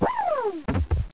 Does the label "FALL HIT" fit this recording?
Yes